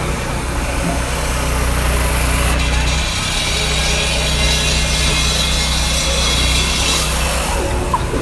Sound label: cutting